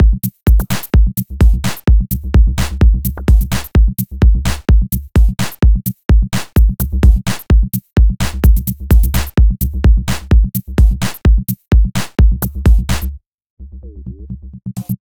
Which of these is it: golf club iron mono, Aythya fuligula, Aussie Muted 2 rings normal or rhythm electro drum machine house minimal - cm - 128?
rhythm electro drum machine house minimal - cm - 128